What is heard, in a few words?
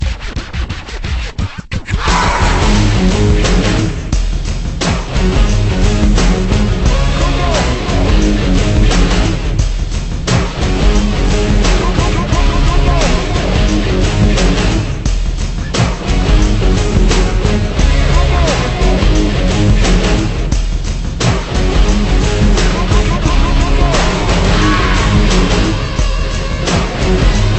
Menu Loop